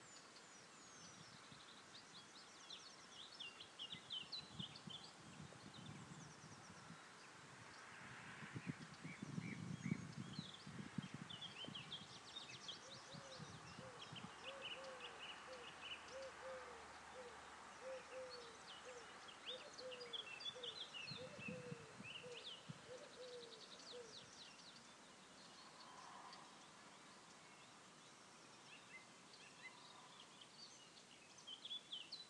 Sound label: birds sound